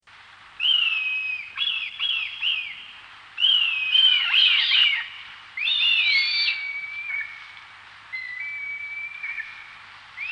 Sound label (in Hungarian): Vцrцs kбnya; Madarak